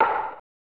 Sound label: Baseball Bat Hits Ball; Super RBI Baseball 1995 SNES